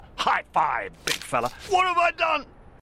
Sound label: Funny Arm Break